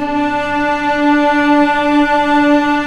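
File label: cellos D4, String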